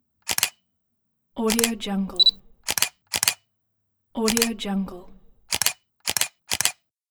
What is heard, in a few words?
camera shutter